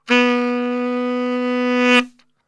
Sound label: B 2 SAX, Brass Super Section; Best Service